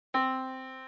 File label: yamaha Disk